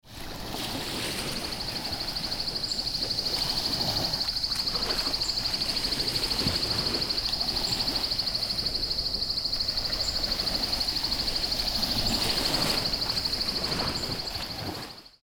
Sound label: PARADISE CHOCO amb